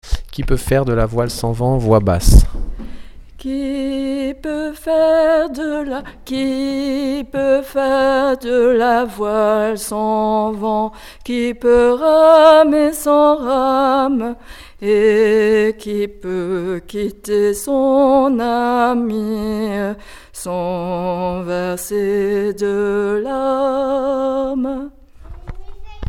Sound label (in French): Qui peut faire de la voile sans vent voix basse